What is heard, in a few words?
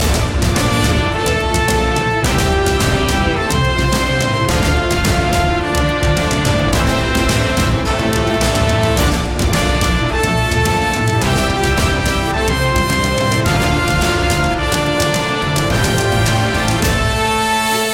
battle in 8-bit space loop